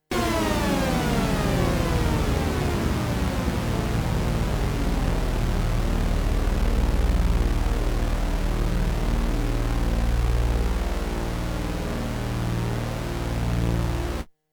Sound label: noise